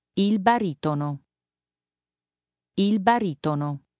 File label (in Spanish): baritono